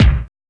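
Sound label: BASS DRUM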